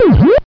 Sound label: eating cherry; sadpuppy